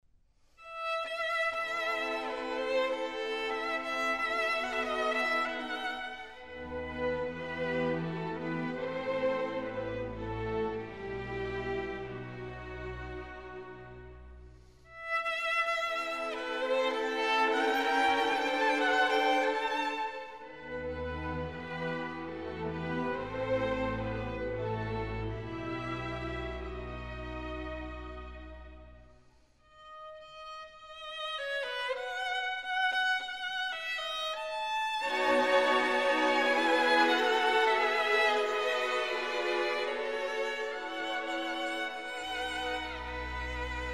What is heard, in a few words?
Violin Concerto